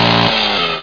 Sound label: buzzsaw; Tanks